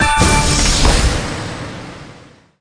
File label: respin End; wolfcub